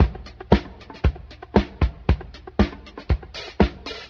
stright up and down, drum loops, funky

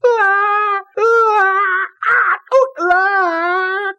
scream

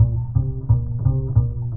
bass, Loops

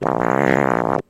fart medium

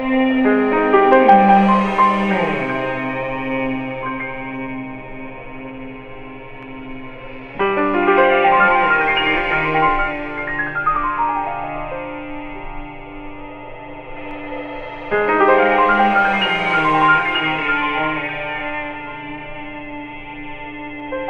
piano and strings, sad loops

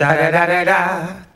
dah dah dah dah SUNG, Voice

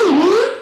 siren, Vehicles

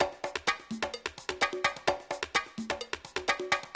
ice bongos, Drum loops

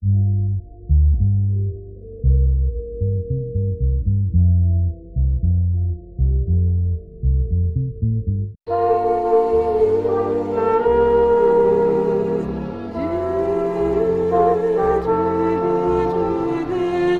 horns voice, jazz loops

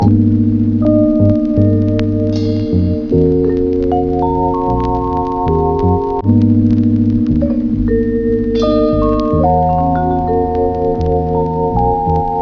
keys

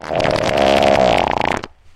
fart long

